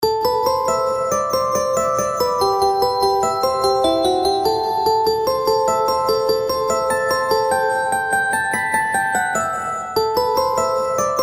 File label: Jig; Burke d’O’Carolan